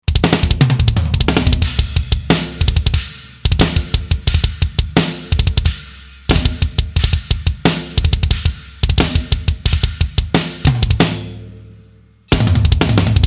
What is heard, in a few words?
Drums example no verb; ashkelon basher kit